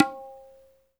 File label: INDIA PERC